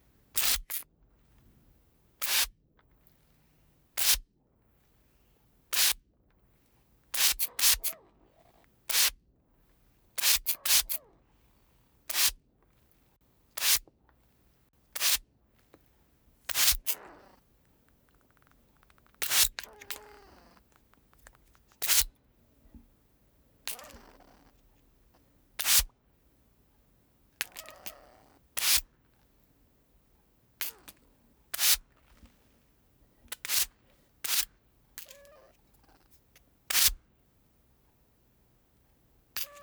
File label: props water spray mono; Soundholder; Props Box